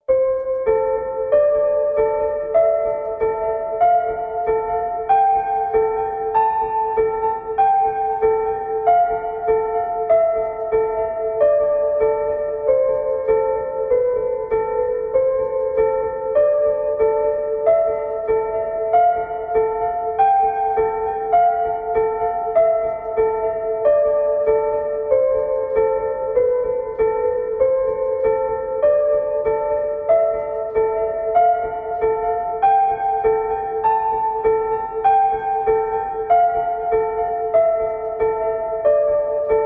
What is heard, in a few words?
piano, ambient